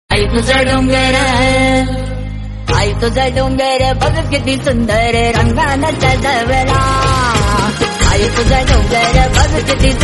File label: instrumental